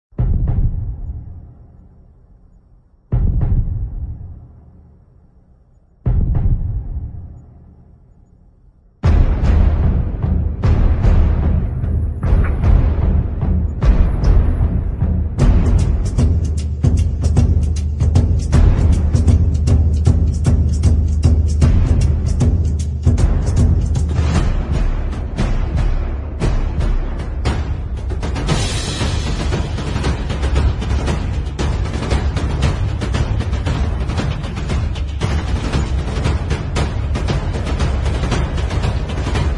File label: drums